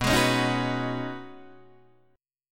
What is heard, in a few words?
B 6th Add 9th B Guitar Open D, chord